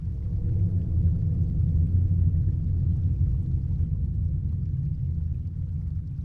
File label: Cave Hum; Nicktoons Nitro; arcade